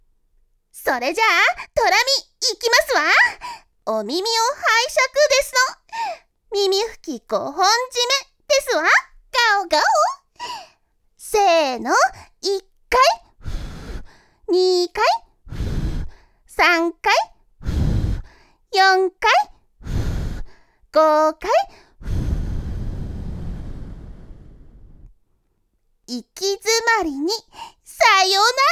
耳ふき; 耳ふき5本締め

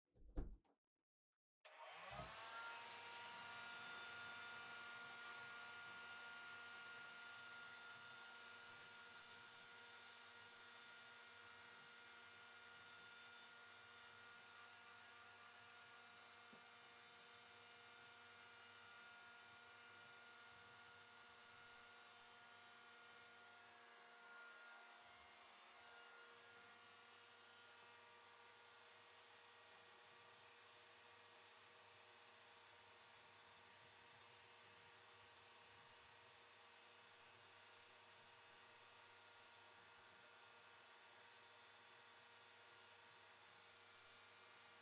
ドライヤーをあてる; KU100; お風呂に関する音

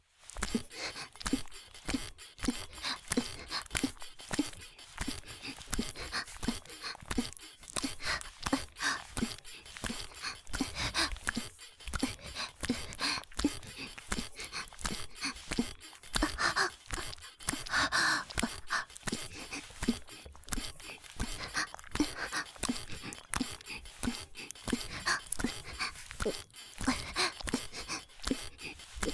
ギシアンループ; 洋館のクールな美人メイドと自己犠牲愛

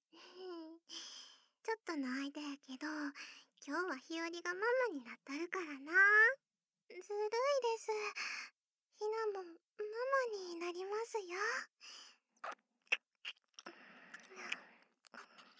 優しいキス添い寝(BGMあり); ひよりママ＆ひなたママ; 左右反転

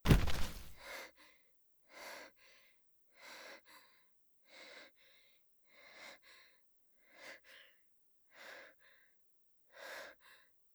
薄呼吸 倒れ込んで; 効果音あり

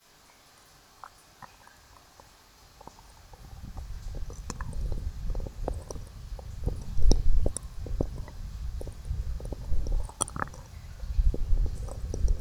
左耳奥舐め, ASMR

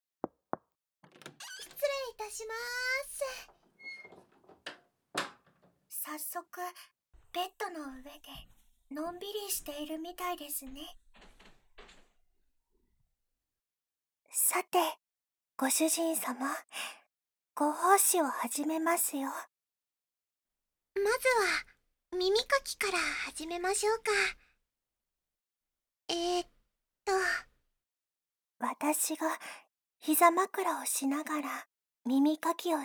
耳かき, ASMR; 真夜の耳かき（右）

